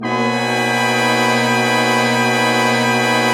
BRS, LOW BRASS; Roland - LA Composer vol. 1

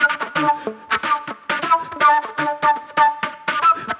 Spit Plate Flute

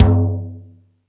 Rap Session, E-MU Pro-Cussion

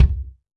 Ludwig Kick; Digital Sound Factory

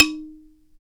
BALA, Balaphone

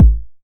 909 BD; Goldbaby Tape808 & Tape909; The Tape 909

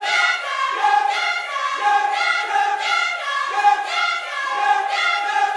CIR YES, Vocal Gospel CIRCLE LPS; Vocal Planet Vol1 Gospel

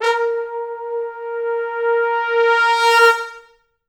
A#3, Pop Brass; Best Service ProSamples vol.19; AKAI

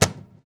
CAJON; Univers Sons - Raricussions

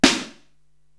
SD Amb. House, Korg TR-Rack drum machine

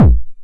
909 BD Tape Sat

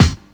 BD, Dance Mega Drums, BD HOU; Best Service

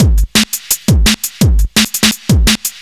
Beat, Drum N Bass; Beats; Sonic Foundry (Sony Creative Software); Knutrix Drum & Bass

